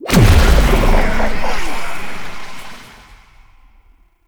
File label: Struggle Prompt Start; Warframe; Lotus Eaters; Quests